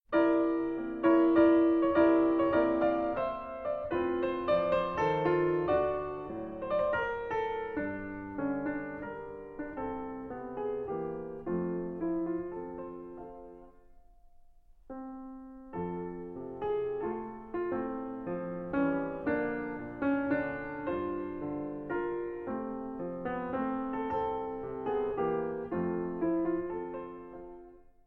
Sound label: Waltz